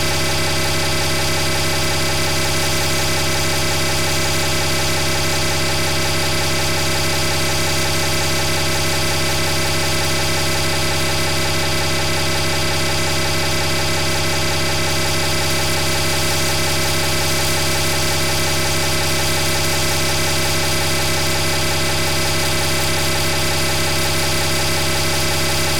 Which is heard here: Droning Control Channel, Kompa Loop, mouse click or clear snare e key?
Droning Control Channel